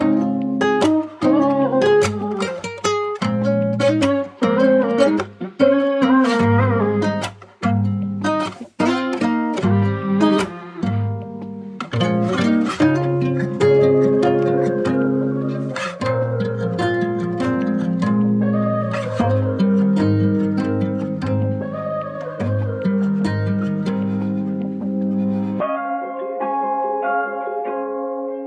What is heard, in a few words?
75bpm Ab major